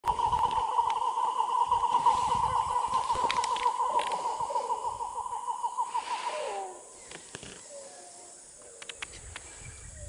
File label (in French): oiseaux